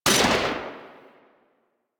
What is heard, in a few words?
Artillery Mech; Warframe; Vehicles; NechroMech; Abilities